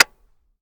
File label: disconnector; viper